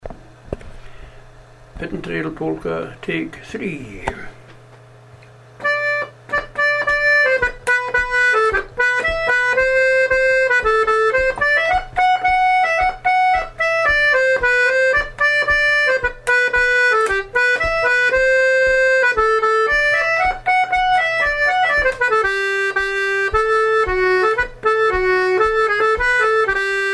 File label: Polka